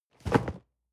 cardboard box medium fall low; objekte

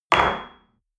espresso place on table hard

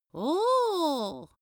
surprised grandma; vocals; comedy-cartoon; soundpool

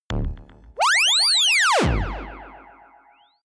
signal booster; sweeps swells; comedy-cartoon; soundpool

